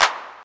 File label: clap single hit c key, hand clap tuned to the C note. Loudest frequency: 2353Hz; percussion; single hits one shots